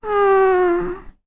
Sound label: Aww; Bomber: "Aww..."